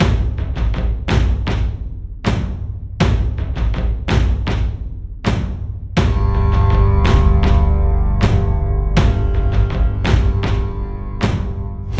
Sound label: Drums